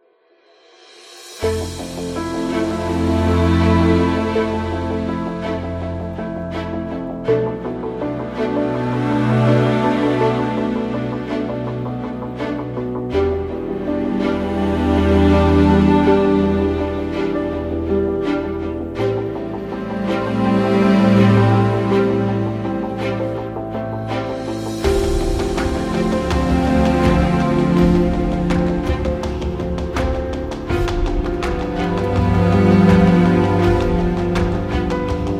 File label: Documentary Music; cinematic action